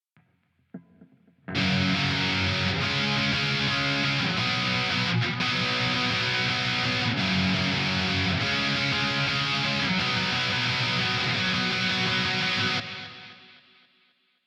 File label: guitar on